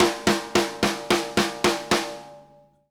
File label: SNARE L, drum fills; Sampleheads - New York City Drumworks VOL-1